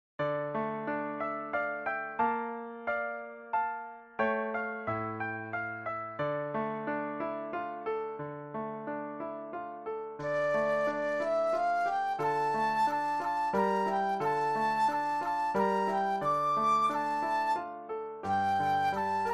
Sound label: Flute Solo; christmas